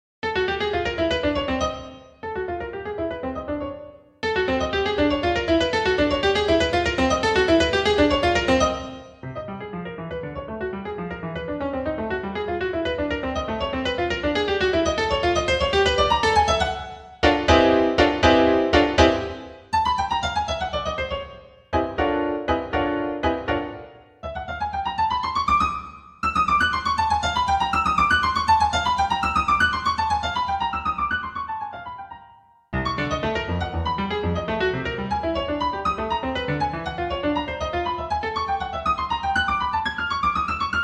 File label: piano